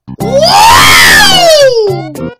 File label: jump; svencoop